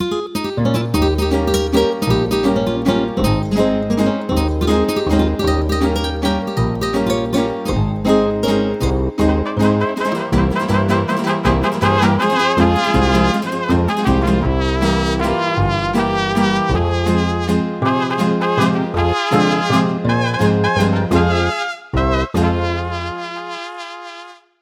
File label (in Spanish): Mariachi 3 4